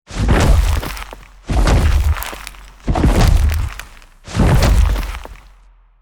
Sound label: Herbivore dinosaur giant footsteps